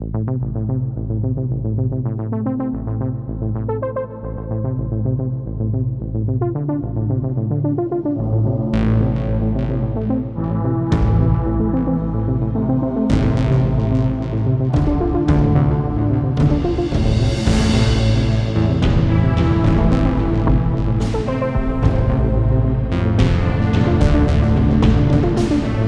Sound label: PUZZLE SOLVED; escape academy